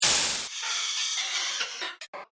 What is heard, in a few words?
Airy Impact And Disjointed Fallout; Miscellaneous Impact Sound Effects; found sfx; Andrew Fleming